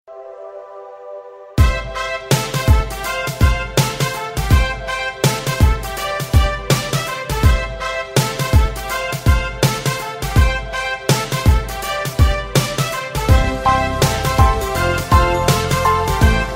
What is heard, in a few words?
Trumpets, ringtone